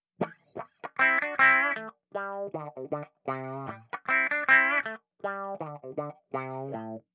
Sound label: wah